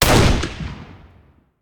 Rifle; Sound Ideas; Guns

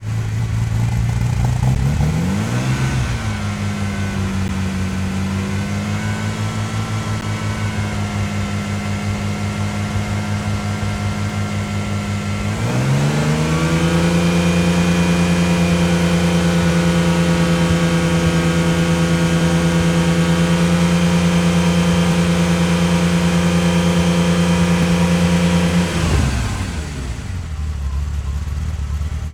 Ferrari Testarosa Fast Idle; Automobiles

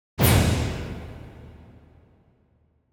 Symphonic Hit Short Finale - Single; Hollywood Edge; sound designer's toolkit v2

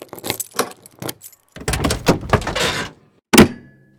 Auto Door Open Brake Release; Automobiles

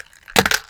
matches drop; best service Blue Box

